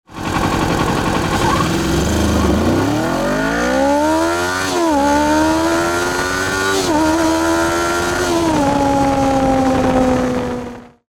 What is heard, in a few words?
ninja400 ex400g acceleration